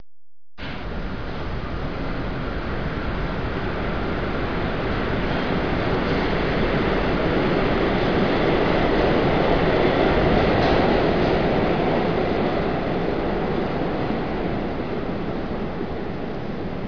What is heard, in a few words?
train pass by